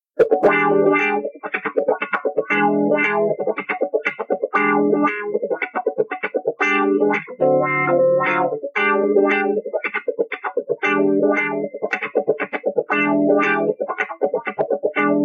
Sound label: funky